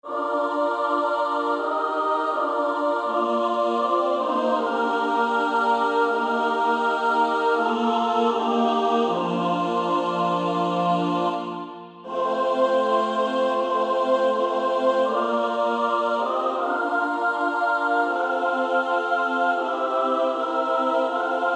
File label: Female Choir SSAA